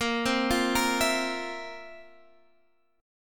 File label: F Major 7th Suspended 4th Bb